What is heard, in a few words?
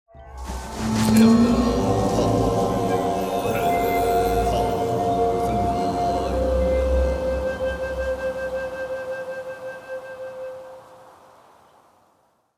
bonus Prize build Up; Shamans Dream 2